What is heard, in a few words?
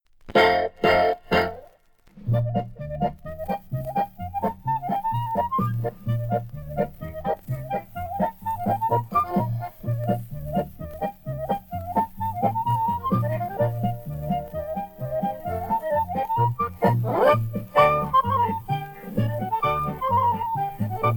little brown jug polka corrected sample